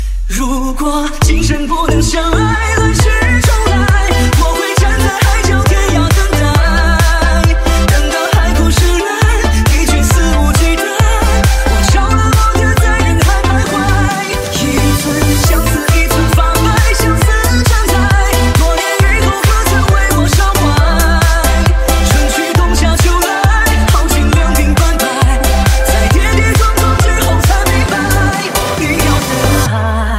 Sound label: remix